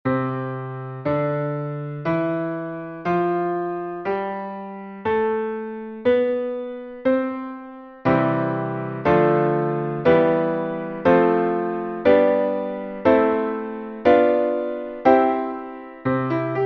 Chord Shapes, Basic Scales And Triads, C; Chords Packet 1

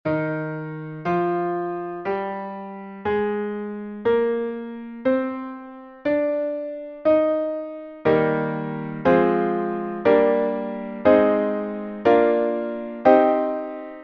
Chord Shapes Basic Scales And Triads Eb; Chords Packet 1

